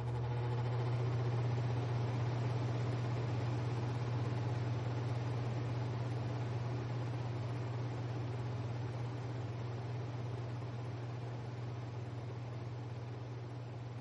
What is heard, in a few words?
Police